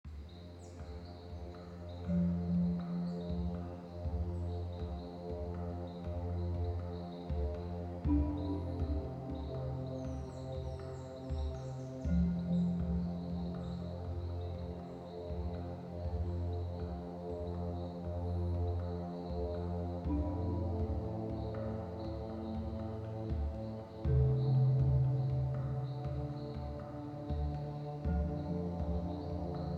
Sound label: Jungle Rumble